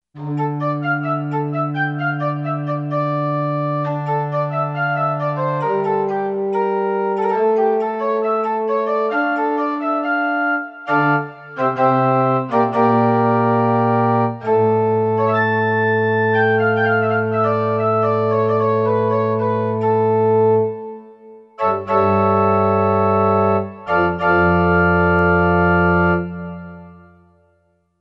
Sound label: Principale, Alesis Z3; Sanctuary